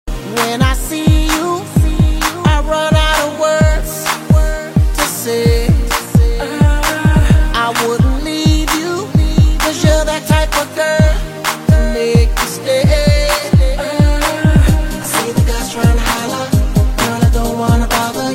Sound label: Beautiful Ringtone